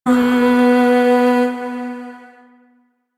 Void Shawzin Oct One B; Warframe; Zariman

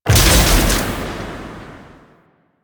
Blast Door Close; Ambience; Warframe; The New War; Quests; Zariman

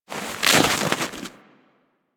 Armadillo Move Snow; Warframe; Hunting Critters; Foley

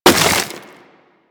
Melee Impact Wood; Warframe; Weapons

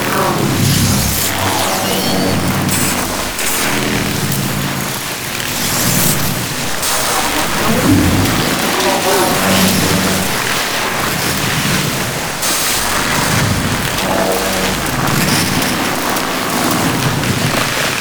Galleon Hazard Elec Floor Loop; Vor Galleon Fight

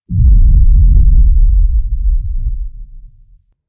Undercroft Sub Sweetener, Ambience; Warframe; Duviri

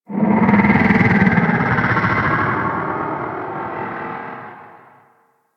Golden Maw Warning Vocal; Ambience; Warframe; The War Within

